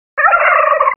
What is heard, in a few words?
Tom Gobble, Turkey